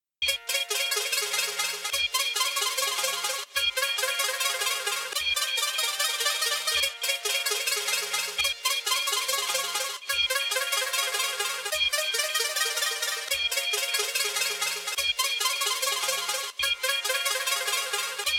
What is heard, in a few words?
Instrumental